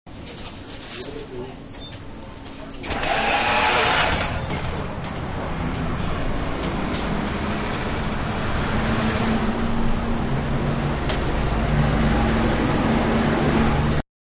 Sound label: Supermarket-Leaving Through Doors Onto Street; Stores And Mall; Stores and Malls; Manhattan Production Music